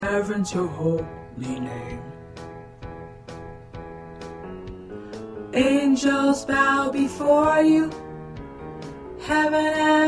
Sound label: Tenor